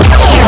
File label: Enemy Fir; Amiga Games 1996 #7; warstar